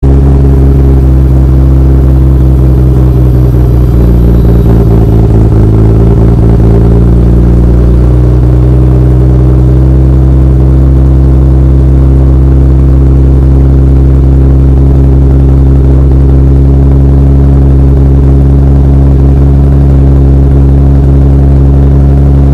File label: idle, engines; SKYLINE